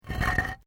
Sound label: PAVING STONE MOVE, dragging; Material components for constructing more complex sounds; Objects